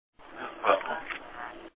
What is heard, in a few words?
radio sweep